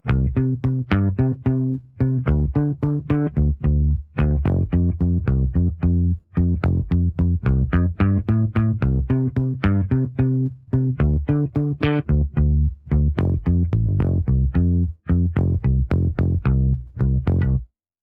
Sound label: stems bass; logic pro 11